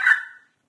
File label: jungle default sound; windows 9x; sound schemes